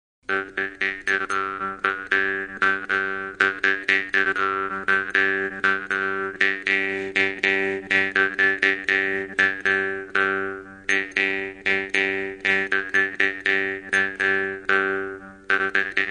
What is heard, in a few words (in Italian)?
scacciapensieri